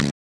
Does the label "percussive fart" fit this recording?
Yes